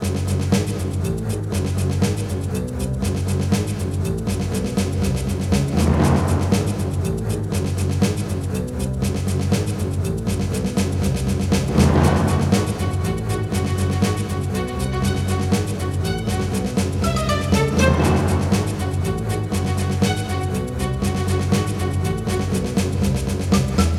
Boss combat; Bosscombat Ch02a 04

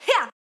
Swipe; Jackie

